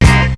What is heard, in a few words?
shot; BEAT2000